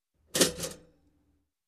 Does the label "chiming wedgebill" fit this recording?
No